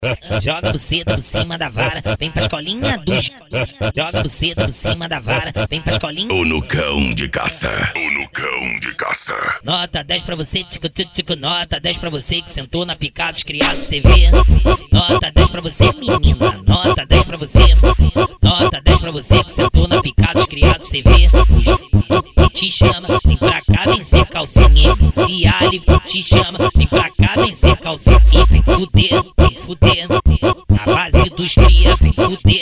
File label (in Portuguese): funk ritmado